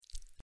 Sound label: Sticky